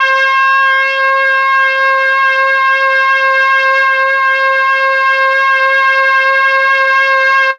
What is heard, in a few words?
MEL BRASS; ILIO; Propeller Island; Legendary M400